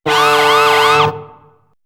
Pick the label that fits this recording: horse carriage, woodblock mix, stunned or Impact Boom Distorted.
stunned